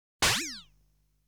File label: Enemy's bullet shot; legend of hero tonma